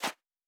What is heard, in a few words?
Footstep Sand Running; Character Sounds